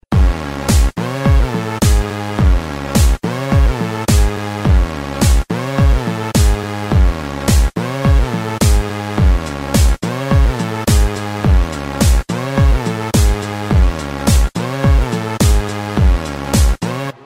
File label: Hard Bass